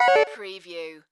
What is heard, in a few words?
Multimedia Chime